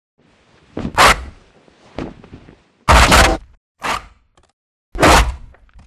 flyer dropship attack close; boneyard; Titanfall 2

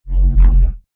Movement Jog; Titanfall 2

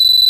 loopable beep; dataknife; Titanfall 2

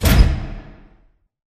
sliding doors open start; beacon; Titanfall 2

